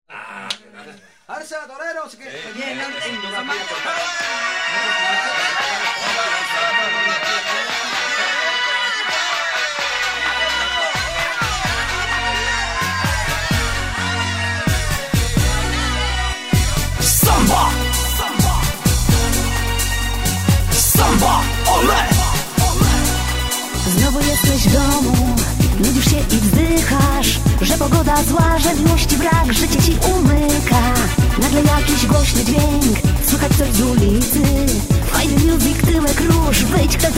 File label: samba rio